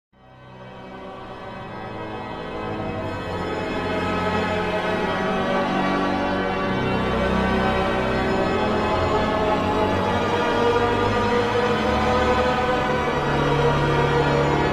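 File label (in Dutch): Psalm, orgel; Nijmegen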